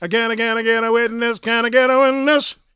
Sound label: GOSP MALE, Vocal Planet Gospel